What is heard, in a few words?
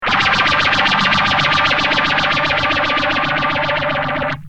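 guitar DOD phasor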